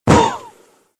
vocal poof; disney

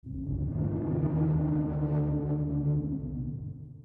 growl; prowler

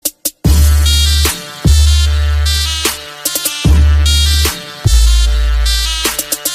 turkish trap music

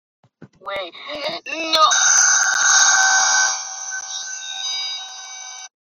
glitch

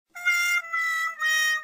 wha whaaaa

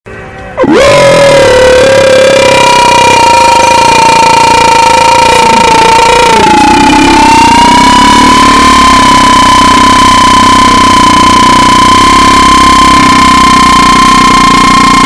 rawr; keratau berisikk

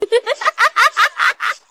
laughing; supergirl

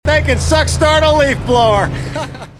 suck start leafblower; paxton

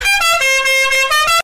bass boosted horn

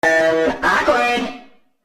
awkward

